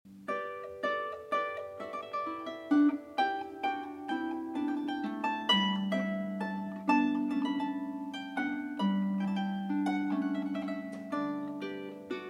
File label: Suite in G Major, Minuet